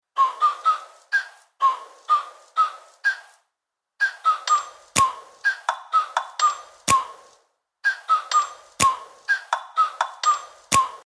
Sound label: jingle